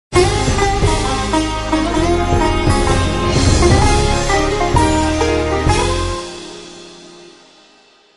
Tv Tone

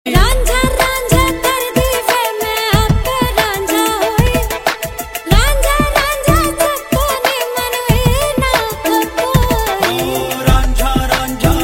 Female

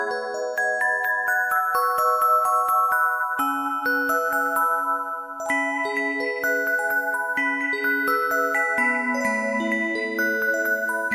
Music Box

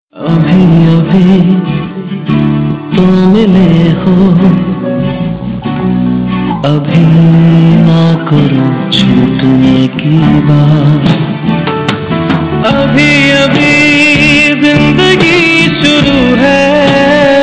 Duet

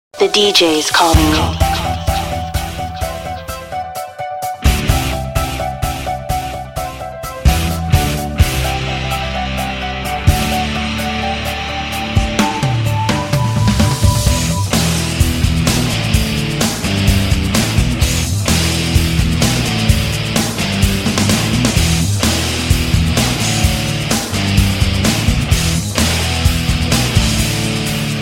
Guitar Mix

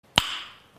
click; Michael rosen

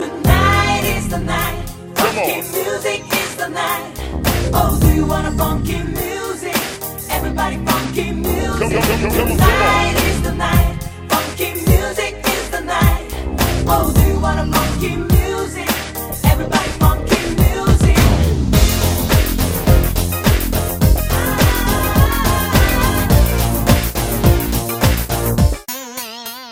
Funky

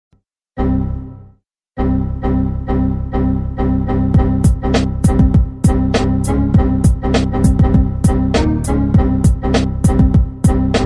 Window Error remix

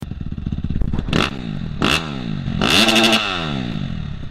450f Dirtbike

